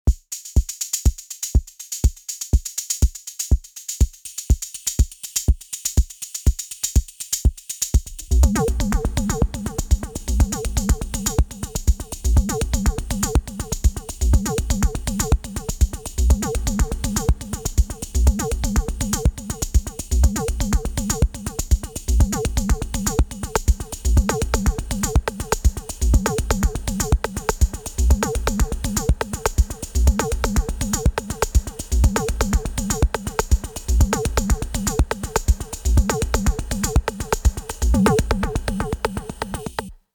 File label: techno